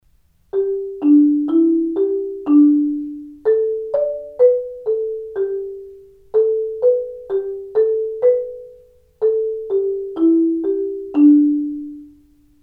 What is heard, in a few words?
mallets